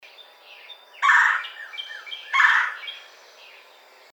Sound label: Red-bellied Woodpecker